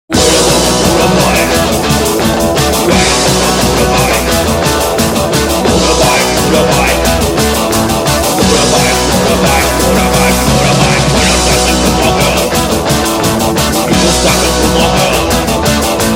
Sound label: Heavy Metal - Motorbike